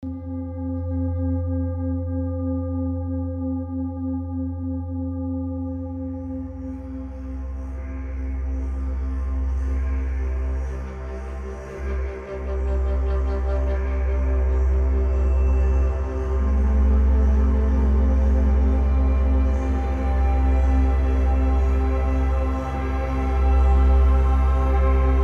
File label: 528 Hz Relaxation